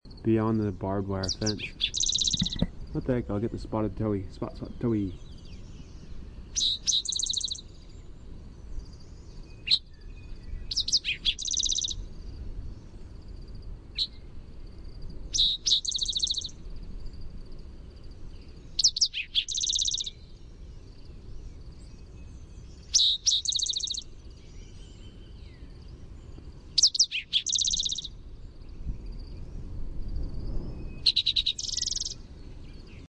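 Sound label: Spotted Towhee Primary Song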